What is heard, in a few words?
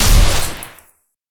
Sci-Fi Weapons-Sniper Rifle Single Shot